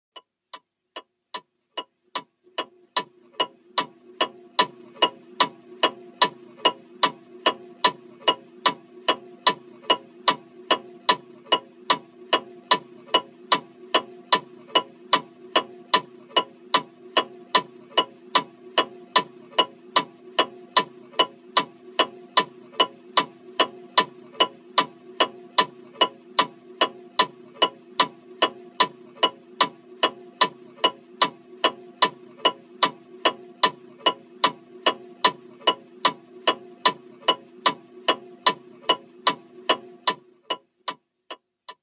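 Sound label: Small Pendulum Ticking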